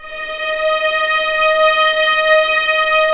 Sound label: STR, Vlns Orch; Roland L-CD702